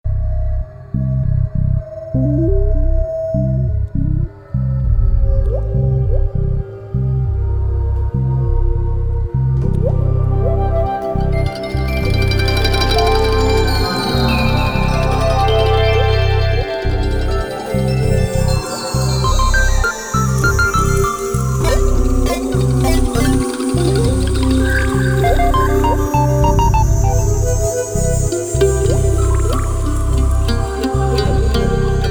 Underwater; Frogger Beyond; GC PS2 Windows Xbox; Underwater Lv. 3 Extra

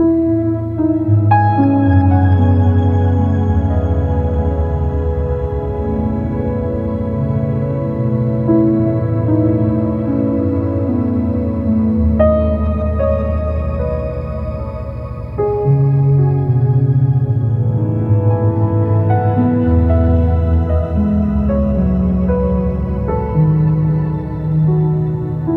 113BPM MIX

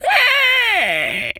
pig scream; infernal radiation 2020 gamerip pc switch linux macos

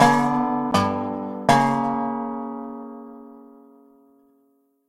Cabin Stinger

